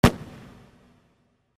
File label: explosion; Kung Pow